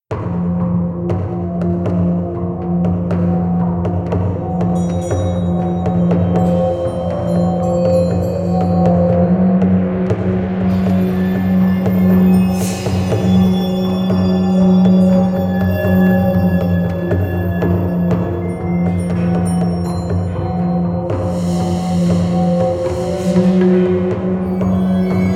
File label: ruined temple loop